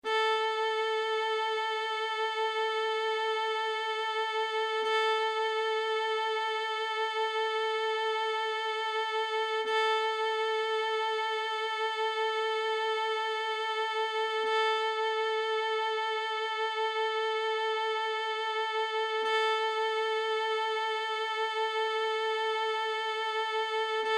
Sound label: Tuning A Violin Sound